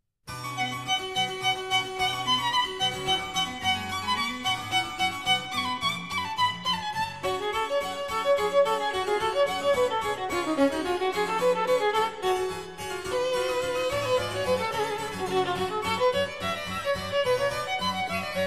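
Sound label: sonata for violin and harpsichord, allegro; sonata dlja skripki i klavesina si bemol minor; BWV 1014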